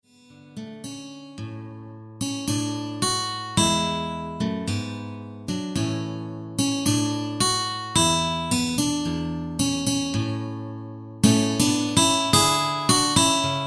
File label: synth